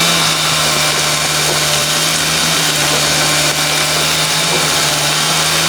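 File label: armadillo power ball loop; rammus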